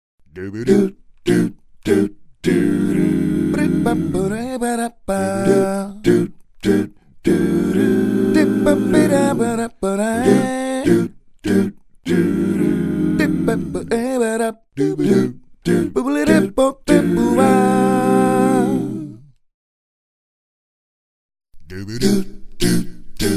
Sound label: Vocals Male